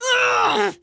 Scout pain severe

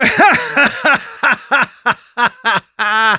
laugh long; Sniper

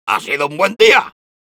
soldier cheers, es

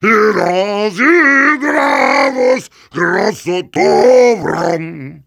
Heavy singing

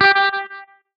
Hitsound menu note

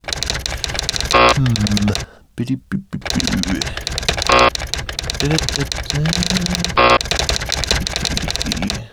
sapper hacking; Wheatley

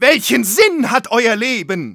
medic jeers, de

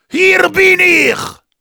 battlecry; Heavy; "Hier bin ich!"

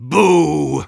soldier jeers; "Boooooo..."

